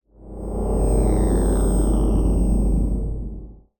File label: Hologram Circle